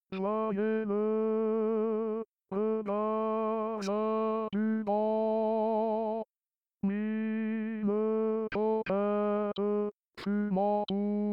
choeur des cigarieres chante; 1 ton

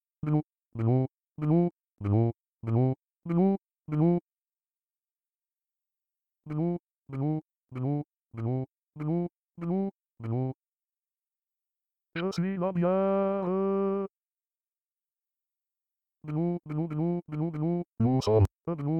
glou glou glou b chante; offenbach

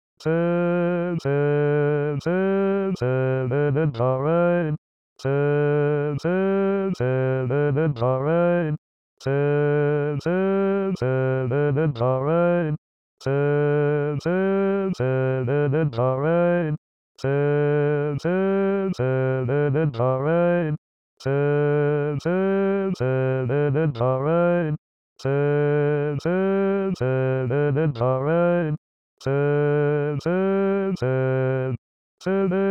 b chante

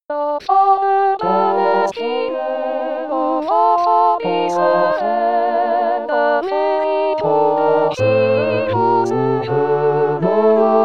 satb chante; noel des enfants du monde

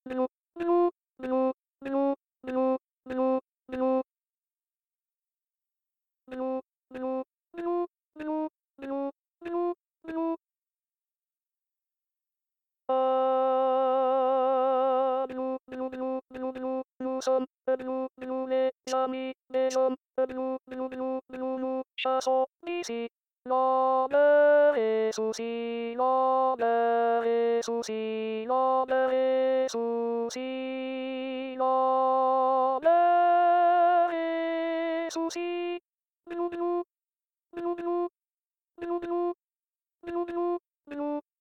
glou glou glou a chante; offenbach

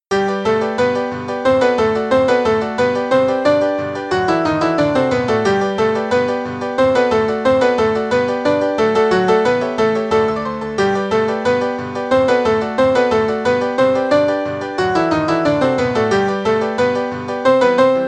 satb accomp; la vie parisienne; offenbah; acte iii

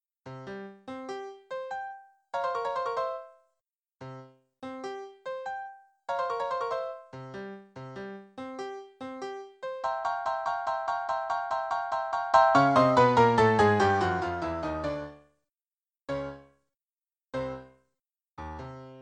s1s2atb accomp; glou glou glou; offenbach

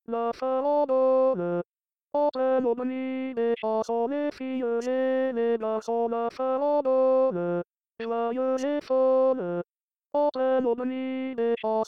farandole t chante

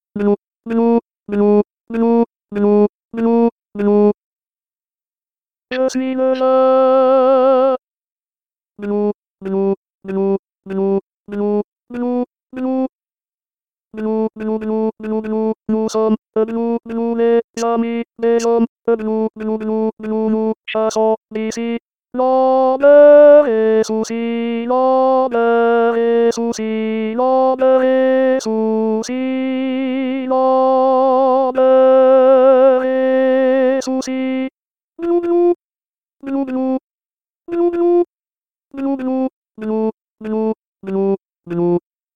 glou glou glou t chante; offenbach

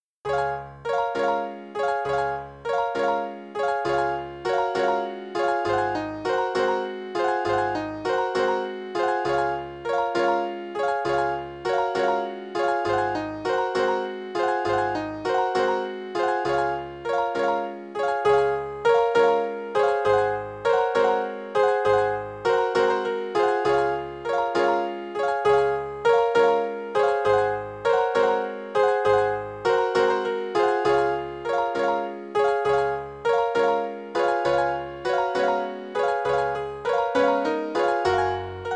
barcarolle accomp; barcarolle des contes d hoffmann; 1 demi ton

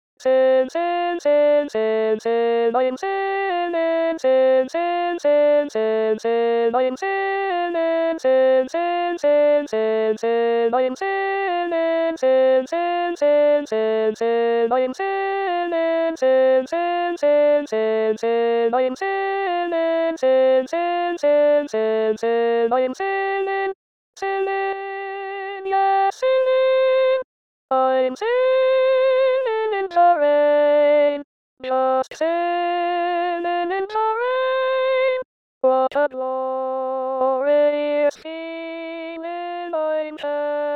a1 chante

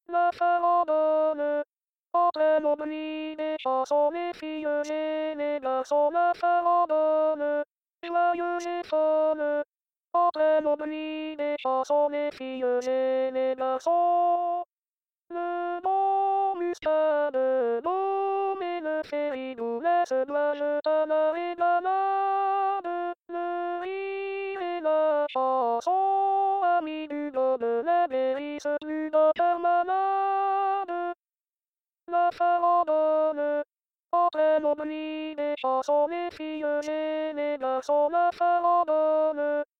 farandole a2 chante

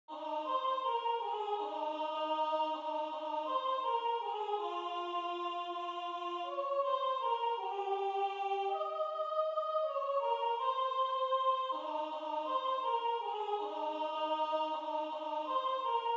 soprano; vive le vent; satb arr. james pierepont

